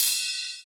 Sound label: Ride; Equipped Music; Loungin House